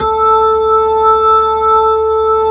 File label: B-3, Keyboards of The 60's and 70's, B-3 Brite